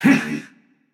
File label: grunt jump; smite; jorm